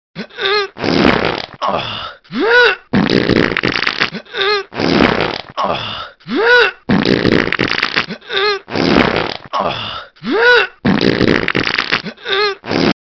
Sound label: Fart; Retro Ringtones